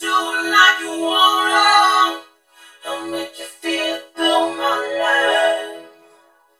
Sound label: DO LIKE, VOCODER, Funky Vocals; USB Soundscan vol.18; AKAI